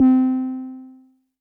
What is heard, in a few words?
Synth Prophet One Shots, Keys C; Electronisounds